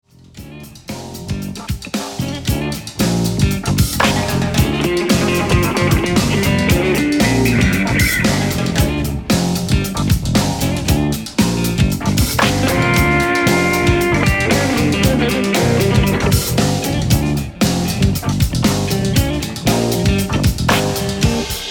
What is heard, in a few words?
Surf, instrumental